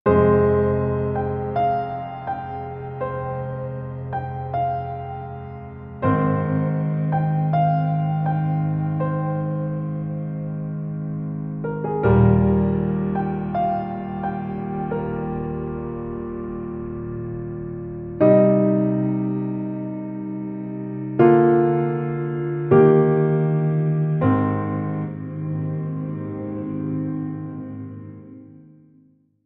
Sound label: Mellow Piano